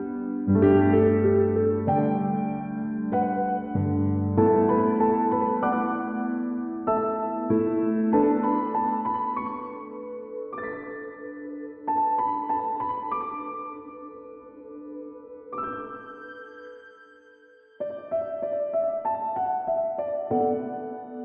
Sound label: Ending Credits Loop; StickerClone